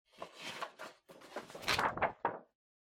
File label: Calendar Flip